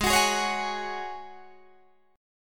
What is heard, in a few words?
G sharp 7th Suspended 4th G sharp, Guitar DADGAD